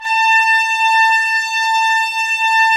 Trumpets; Roland - LA Composer vol. 4

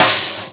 Lofi, Drum Machines; EMU Orbit-3

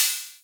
Hats, Elektron MachineDrum; SPS1 MKII

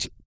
REGGAE, Vocal; ILIO; Vocal Planet; Blues & Soul

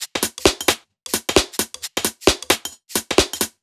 Beat 132bpm, uk garage

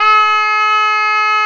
A 3, richter; SoundBytes - Early Patches

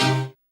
HOUSE, House Dance

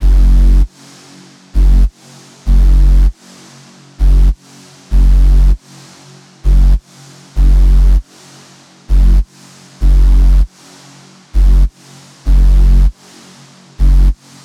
Perfect Reese Sub, bass

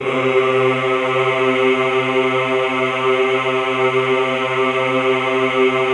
EHH C2, Classical Choir; Best Service; Extended Classical Choir; EHH MIX

